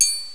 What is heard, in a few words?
tuut; rechenfx